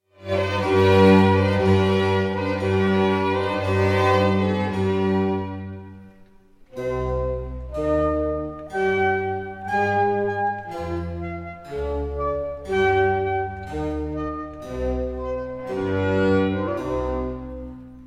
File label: concertino no 2 in e flat for horns flutes clarinets bassoon and strin